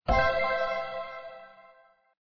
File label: match; fairies